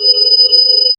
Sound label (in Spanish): un sonido pico como con, gregorian